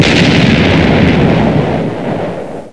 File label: ship explosion